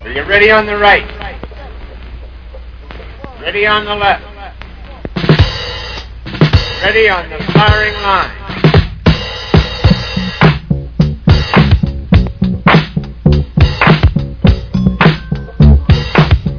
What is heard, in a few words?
Old School Hip Hop